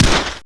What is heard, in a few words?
fire 9mm